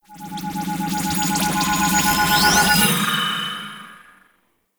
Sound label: Idle Agile Power; Trinity